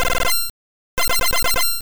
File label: Powder Snow